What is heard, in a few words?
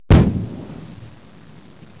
EXPL; explosion sounds